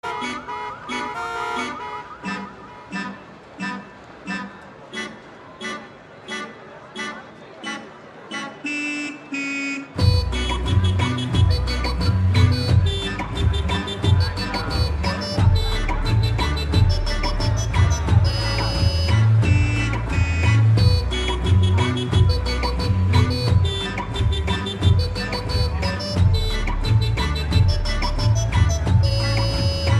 car Remix Ringtone

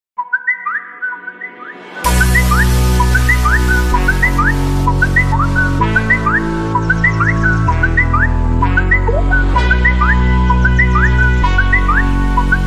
Whatsapp Notification Theme Ringtone Remix

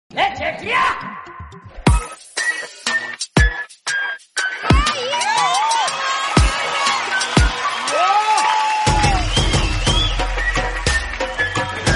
jethalal mobile ringtone

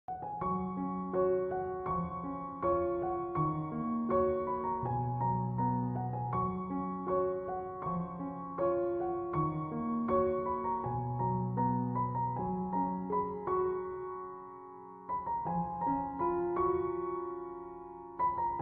Heavenly Ringtone Aesthetic Melody Ringtone

